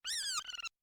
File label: Pellet Damage